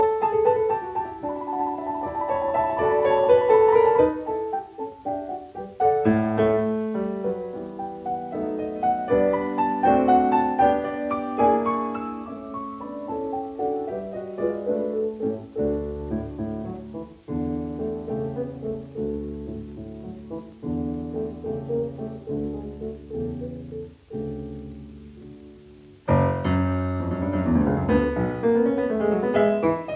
Piano Sonata No 7 in D major Op 10 No 3 III Menuetto Trio Allegro; Claudio Arrau